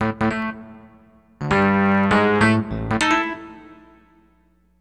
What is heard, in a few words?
Electric Piano, Loops, Keys